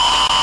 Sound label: new jumpscare; slender